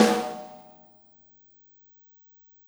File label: Snare Shard; ZeroGravity Drum Shards